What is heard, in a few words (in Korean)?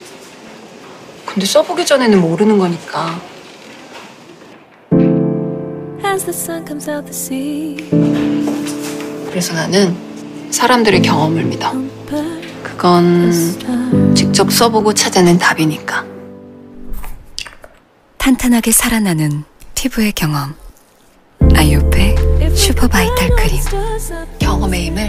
영어여 노래; IOPE슈퍼바이탈크림